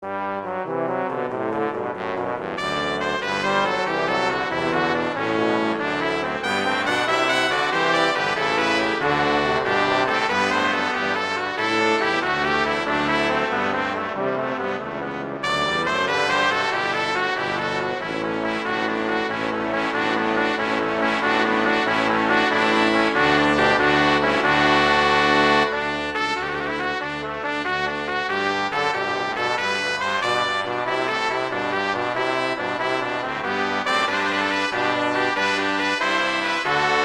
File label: Gigue; Pachelbel; Canon; Brass